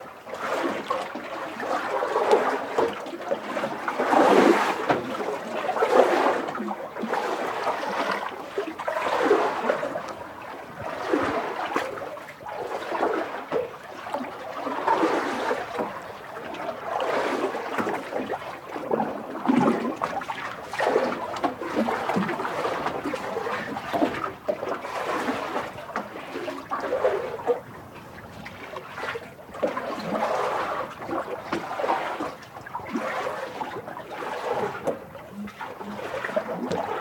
Water Lapping against port, Industrial Harbor; Jake Fielding

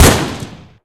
ar1 fire, weapons; hl2

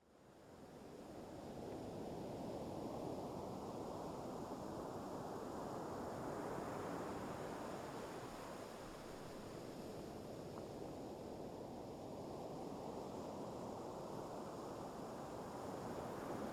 Cromer Beach, 3m from Shore, Heavy Waves; B-Format; Ambisound; Ambisonic

